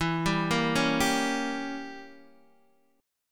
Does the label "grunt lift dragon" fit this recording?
No